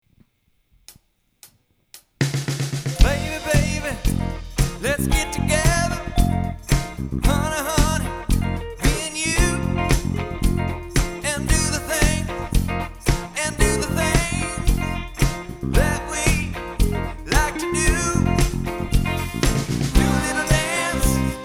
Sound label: Cover Band; Los Angeles Cover Band 1